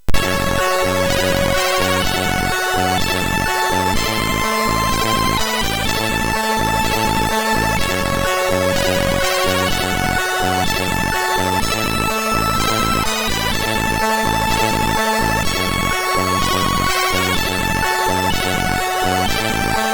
Snare MOS6581R3

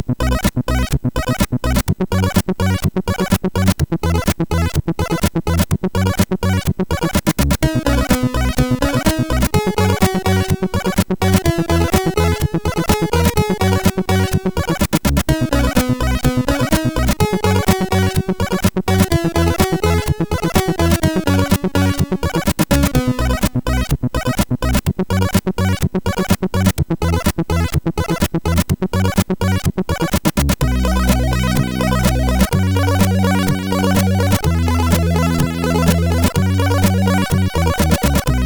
Weird Sounds, MOS6581R4; HVSC

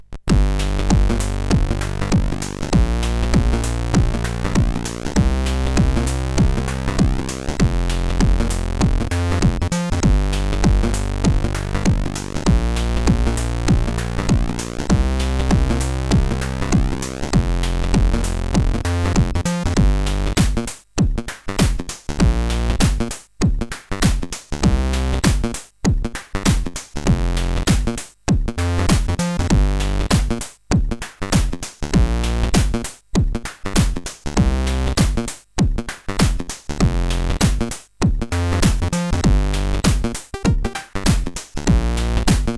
Enjoy the Funk, CSG8580R5

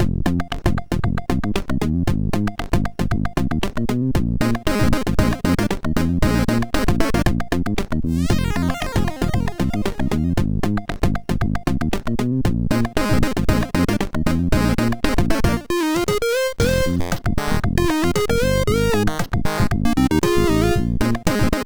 Waves, MOS6581; MOS6581R4

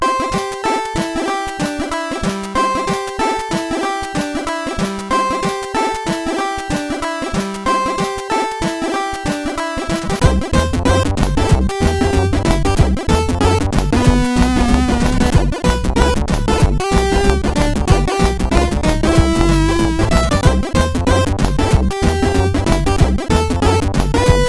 Christmas Boogie, CSG8580R5

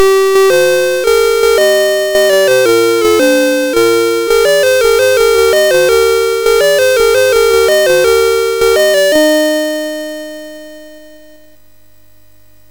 sid MOS6581R3, GAMES; Alice In Wonderland

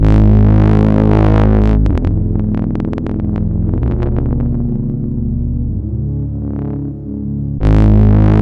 Trip, MOS6581; MOS6581R4; hvsc

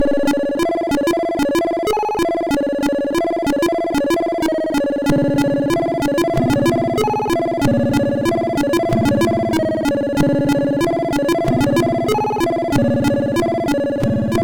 Orchestral, MOS6581R2

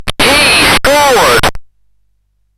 CSG8580R5, GAMES; Slapshot 1987

